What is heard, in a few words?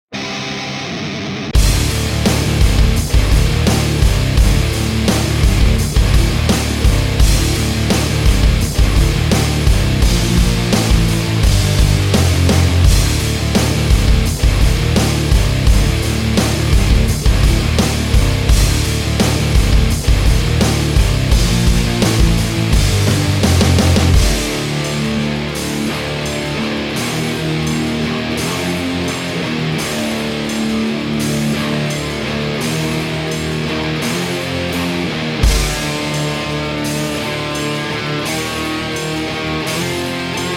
Drive Nu Metal